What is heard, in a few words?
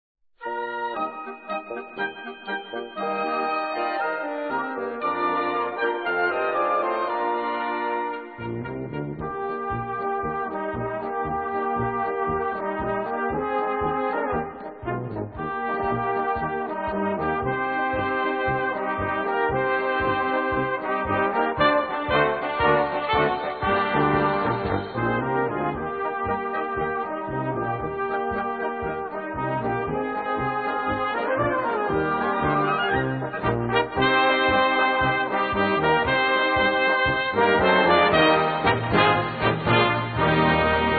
Polka Romantika, Blaskapelle